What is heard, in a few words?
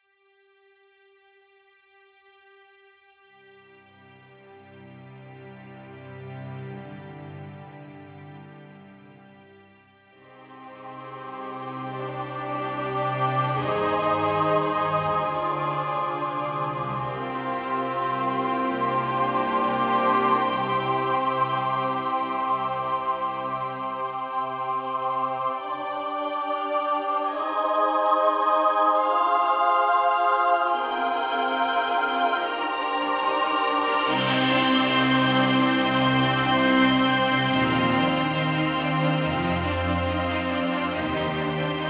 Monumental Score